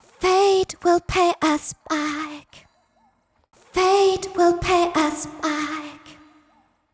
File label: pocket blackhole; flo x ness